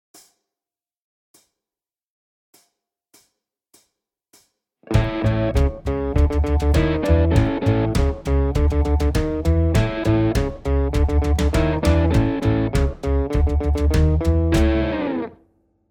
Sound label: Chicago Blues Guitar; Chicago Blues Guitar Lesson